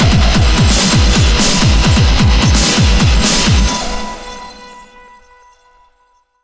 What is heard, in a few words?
Action Boss; PunkGirl